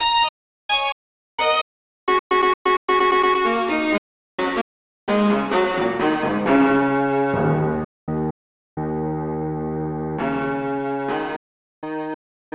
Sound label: String